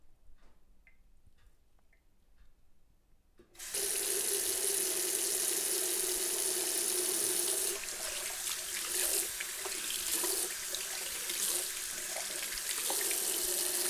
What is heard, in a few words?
Sound Of A Water Tap